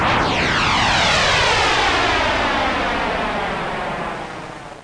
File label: airplan; My Animated Word Book; move